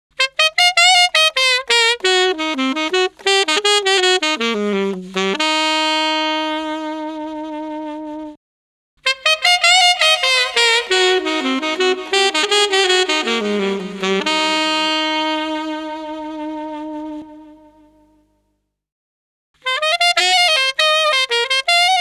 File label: breaks, Jungle; Zero-G Jungle Frenzy 2